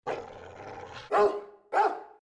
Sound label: doggo bork